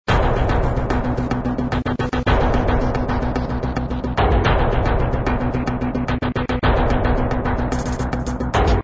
Sci Fi Epic Background loop

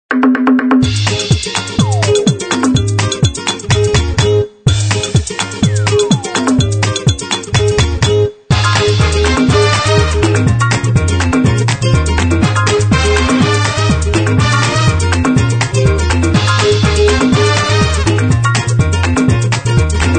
South Beach Samba Full Mix

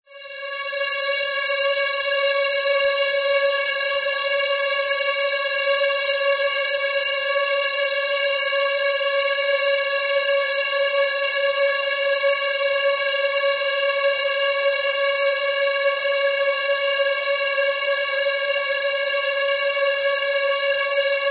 Tonal Ambience C; Cymatics; FX Essentials

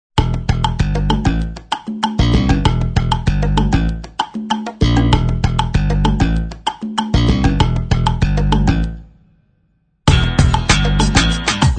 No Vocal

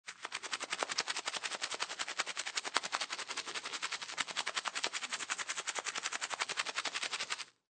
Brush Teeth Fast

